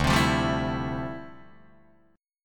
D Minor 11th D down Guitar DADGAD

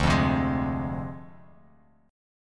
D Minor 7th Flat 5th Bass Standard; Dm7b5; strummed

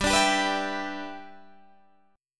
G 6th

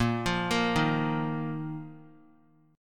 A sharp Suspended 4th; arpeggiated; A#sus4; JGuitar

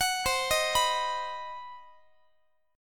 C Minor Major 7th Flat 5th chord, Guitar; CmM7b5; arpeggiated

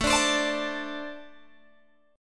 A-Minor Double Flat 5th A Guitar Open G

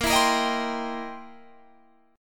C 7th Sharp 9th Bb

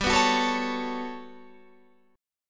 G Major Sharp 11th G down, chord; Listen to GM#11 strummed

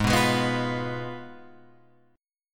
Ab Minor 6th, Ab, Guitar, Open F; Abm6; strummed

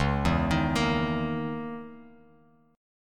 Db Minor 6th Add 9th Db down Bass Standard; Dbm6add9 arpeggiated; JGuitar

